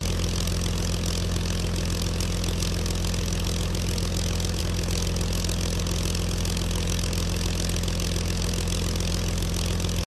ULTRALIGHT PLANE Fly Slow Steady Loop; TRANSPORTATION

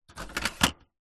office printer paper tray pull slide; zapsplat

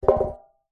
tin metal cylinder lid on knock over on carpet; foley; zapsplat

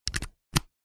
technology usb a cable plug in; zapsplat

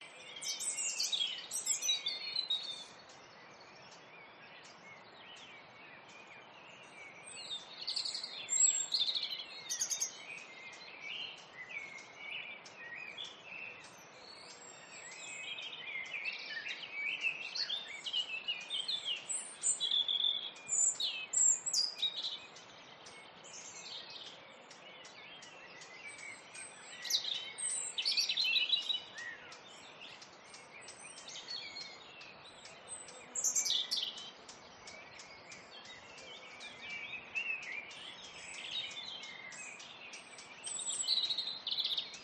Countryside Spring Morning Birds Insects Stream

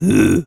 human voice male grunt grumble caveman

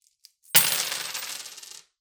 Coins Thrown On Counter

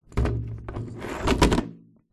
musical drum tom set down

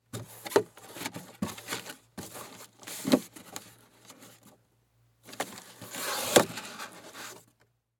foley cardboard box closing; martinimeniscus

